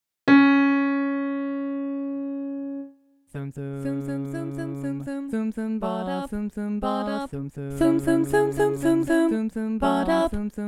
tenor dominant

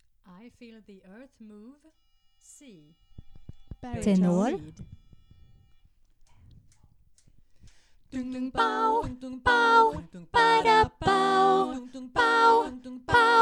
Tenor mix

